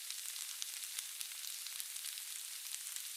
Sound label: Burned Out Crackling; hidden deep windows gamerip 2022